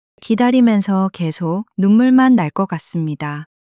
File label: crying